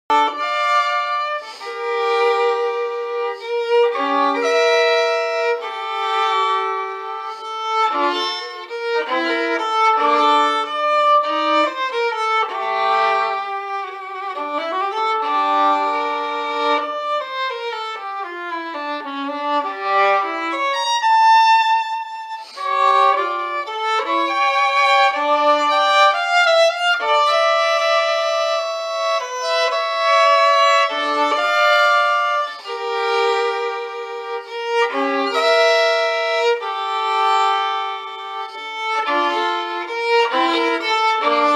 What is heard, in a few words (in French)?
Violon; Saint-Girons